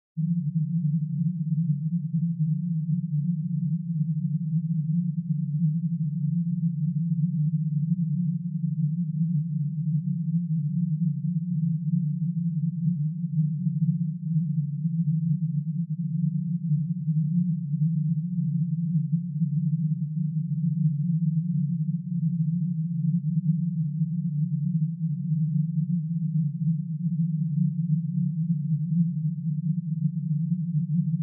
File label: Subwoofer phase: 160Hz; Subwoofer Evaluation and Setup; Surround Spectacular